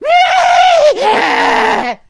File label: jockey, voice alert; Left 4 Dead 2